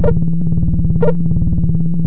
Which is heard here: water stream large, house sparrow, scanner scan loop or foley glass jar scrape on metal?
scanner scan loop